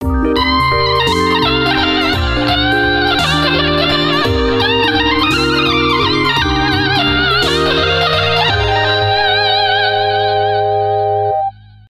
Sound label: guitar; ニコニコ動画